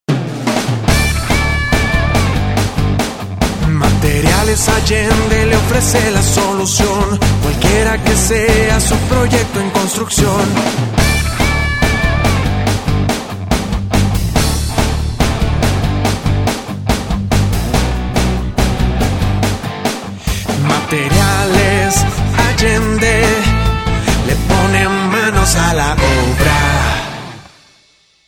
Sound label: JINGLES